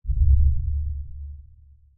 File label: sonar Ping Air Medium Beacon; Barotrauma; Real Sonar